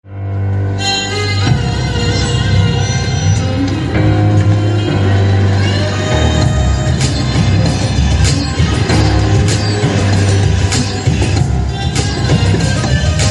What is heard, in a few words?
violin background music; ishaqzaade